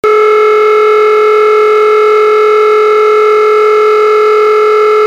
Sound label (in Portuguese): INTERMITENTE, sirene; sirene orbe